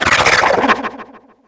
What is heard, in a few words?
triton hit